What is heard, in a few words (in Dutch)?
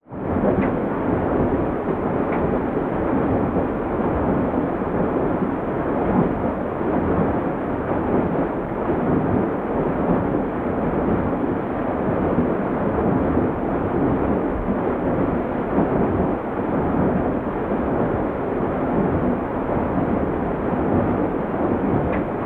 watermolen tussen raderen